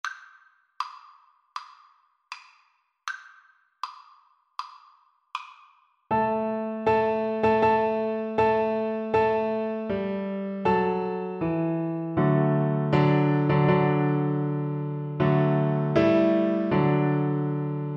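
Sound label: viola karaoke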